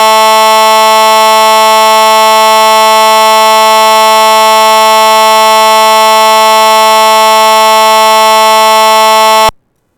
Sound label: HORN, siren